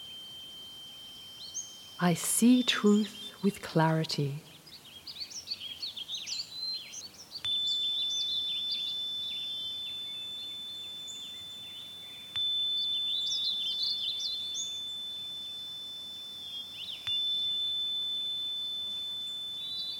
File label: Harmony